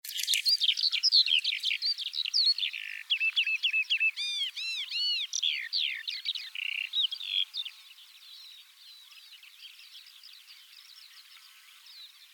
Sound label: Skylark Sound